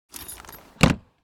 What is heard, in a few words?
Motorcycle storage compartment close; vehicle